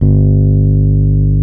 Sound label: Electric Pianos; Wizoo Electric Pianos